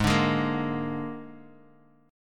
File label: G sharp Suspended 2nd Flat 5th G sharp, guitar; strummed; 16,17,18,15